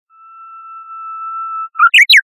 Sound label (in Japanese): ウグイスの鳴き声